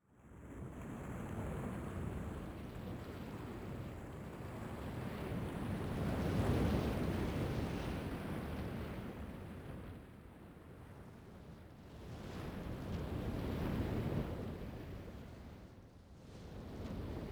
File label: waves